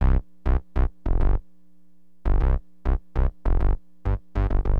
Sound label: SYN BAS, Acid Jazz Funk; Bass